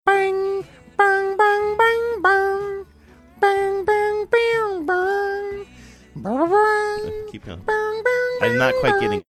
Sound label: imitating music box